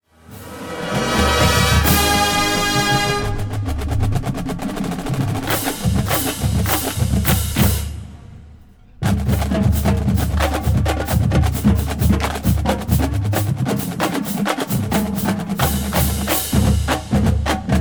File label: Drum Feature, marching band